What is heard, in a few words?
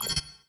ui half time rest